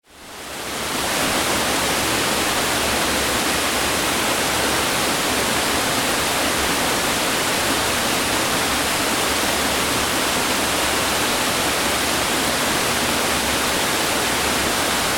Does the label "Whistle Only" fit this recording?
No